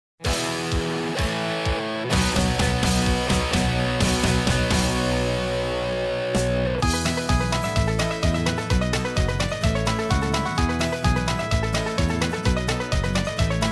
rhythm track, square dance music

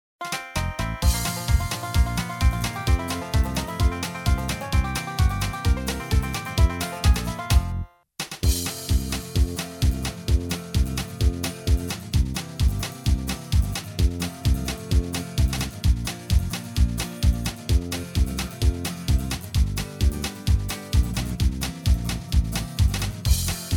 rhythm track, square dance music

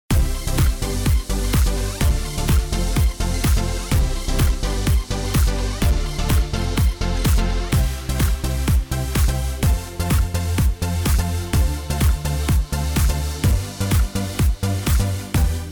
christmas patter rhythem, square dance music

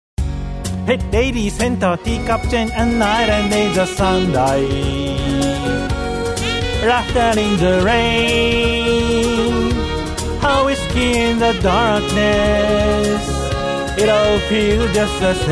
walking on the waves vocal plus

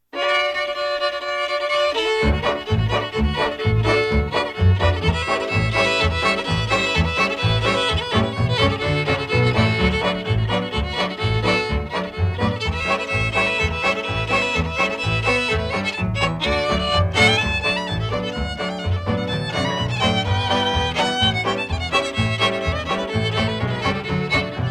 ragtime annie, square dance music

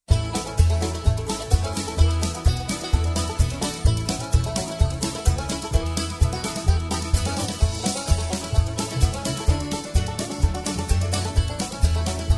no melody, square dance music

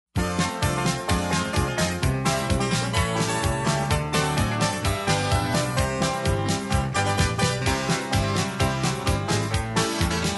rinky tink piano, square dance music

